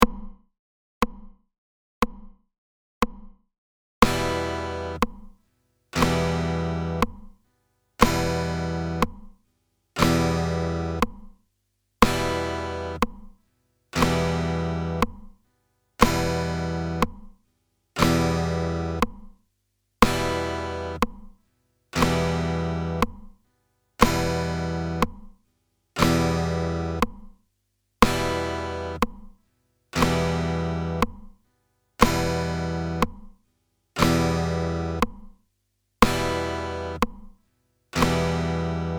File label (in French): Couper le son